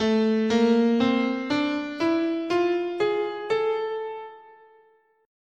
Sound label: A Neapolitan Minor